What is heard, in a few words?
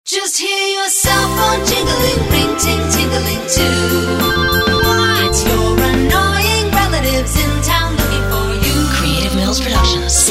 Christmas Parody Jingles